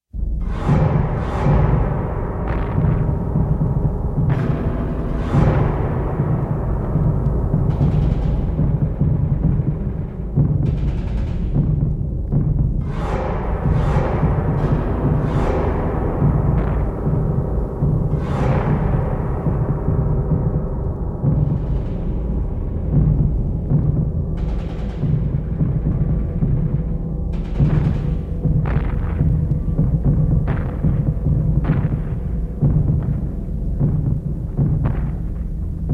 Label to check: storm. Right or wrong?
Right